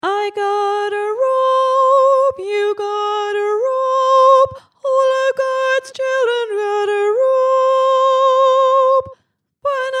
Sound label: Al gods chillun Soprano